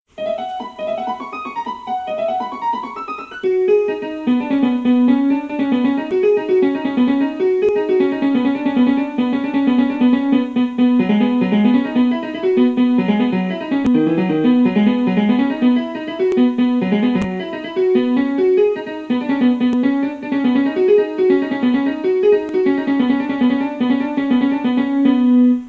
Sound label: piano version